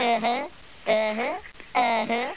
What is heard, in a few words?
UHUH